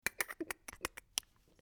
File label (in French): ouverture cutter; Fourniture